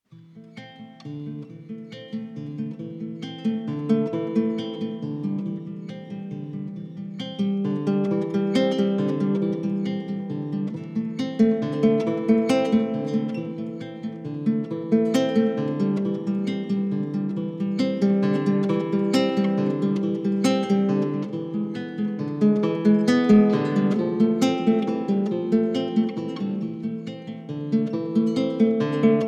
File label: Credits 136 bpm String Only